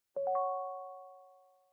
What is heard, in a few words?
call join; mail; odoo